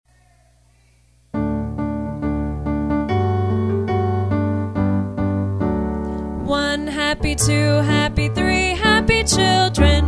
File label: vocal